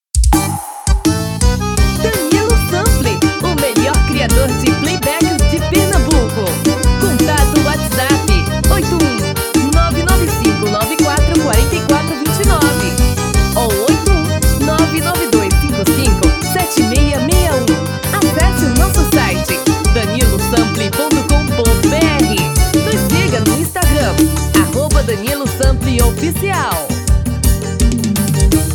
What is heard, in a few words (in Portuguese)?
Xote Vanerao